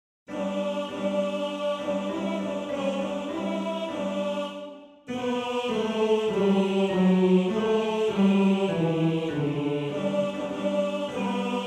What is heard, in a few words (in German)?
Tenor; Quel Mazzolin; Tenor 1